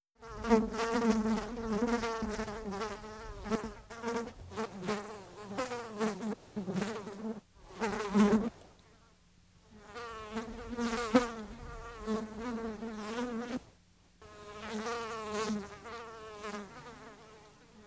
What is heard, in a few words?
Fly Buzz